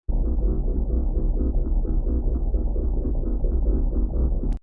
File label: submarine rotors